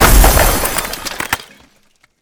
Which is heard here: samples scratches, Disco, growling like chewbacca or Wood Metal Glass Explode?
Wood Metal Glass Explode